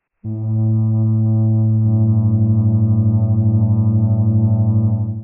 flöte a g; Intervalle, die man durch Potenzen von 2 und 3 darstellen kann

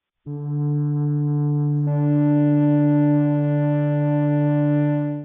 flöte d2 d2; Intervalle, die man durch Potenzen von 2 und 3 darstellen kann

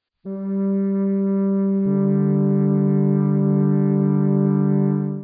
flöte g1 d1; Intervalle, die man durch Potenzen von 2 und 3 darstellen kann

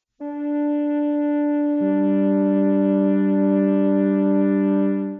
flöte d2 g1; Intervalle, die man durch Potenzen von 2 und 3 darstellen kann

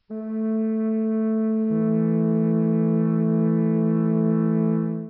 flöte a1 e1; Intervalle, die man durch Potenzen von 2 und 3 darstellen kann

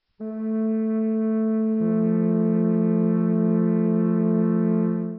flöte; Intervalle, die man durch Potenzen von 2 und 3 darstellen kann; a1 f1

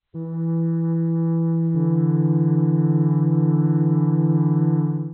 flöte e1 d1; Intervalle, die man durch Potenzen von 2 und 3 darstellen kann

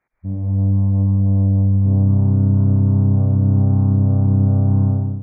flöte g c1; Intervalle, die man durch Potenzen von 2 und 3 darstellen kann

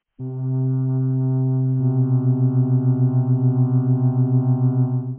flöte c1 h; Intervalle, die man durch Potenzen von 2 und 3 darstellen kann

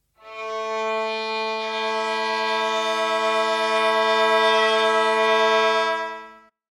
geige

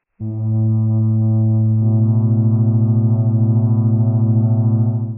flöte a h; Intervalle, die man durch Potenzen von 2 und 3 darstellen kann

